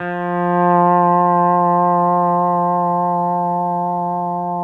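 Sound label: pedal steel swell F3; PEDSTL SWELL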